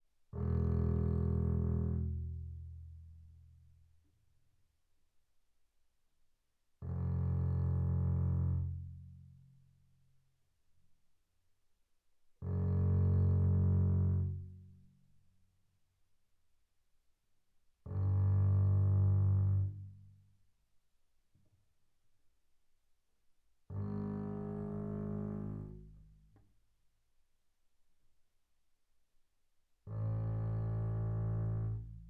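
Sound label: Bass arco sul E mezzo forte E1 B1, double bass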